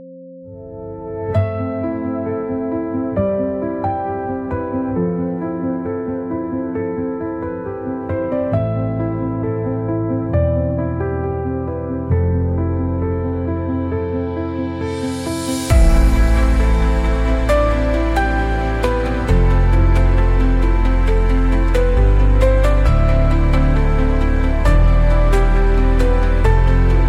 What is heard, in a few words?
528Hz